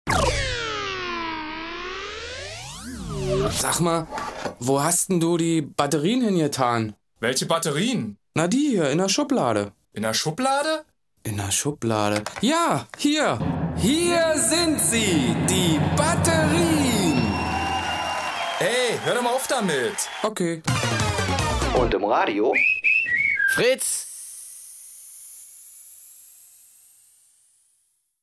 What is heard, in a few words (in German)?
fritz jingle batterien